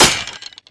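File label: DMG skeleton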